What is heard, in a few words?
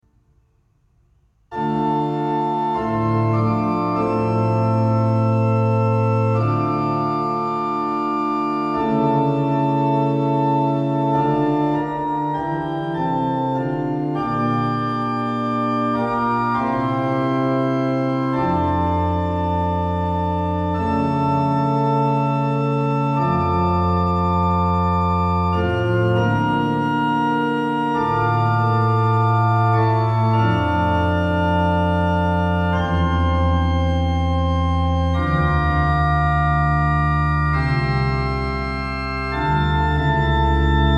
framework hymn tune; computer-music